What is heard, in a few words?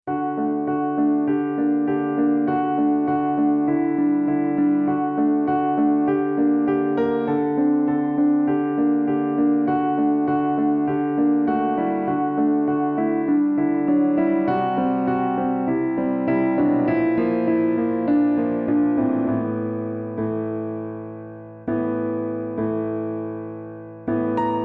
Relaxing Piano Music